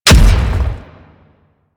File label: Thumper Bot Step Heavy; Warframe; Grineer; Movement